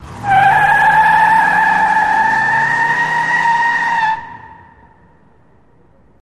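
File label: Car Long Peel No Rev, skids autos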